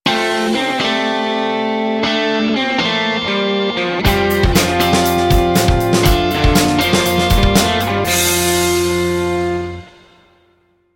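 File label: Loop Indy Rock Stinger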